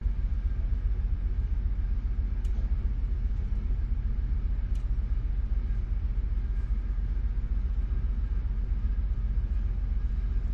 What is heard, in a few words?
canadian ferry before departure on board; your free sounds